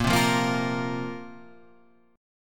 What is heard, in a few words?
A sharp Suspended 2nd down Guitar Half Step Down